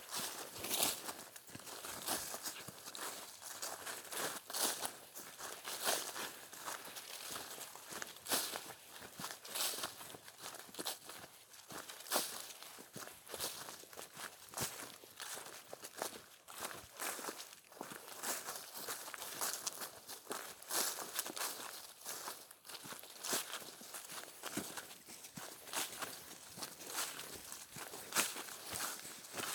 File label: Two People Are Walking In Foliage